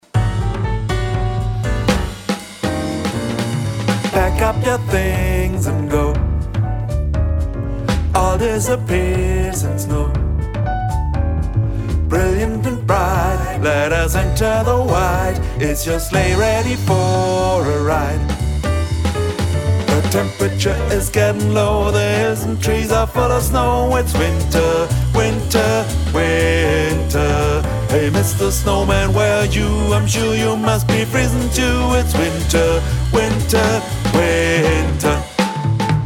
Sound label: Bass